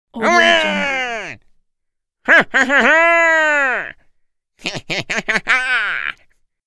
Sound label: tricky gnome win happy